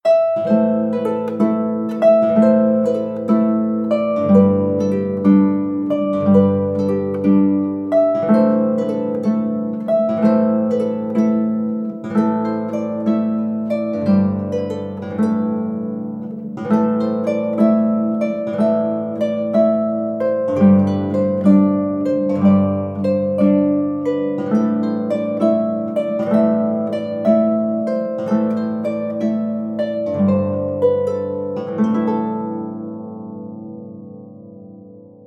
ebony harp traditional sequence

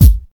short bass drum single shot C key

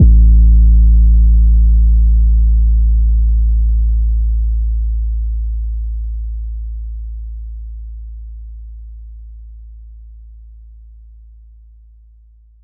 phonk kicks oneshot 808 a; kick drum

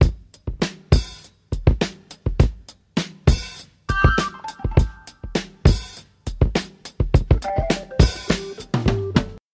101 bpm modern drum loop sample g sharp key

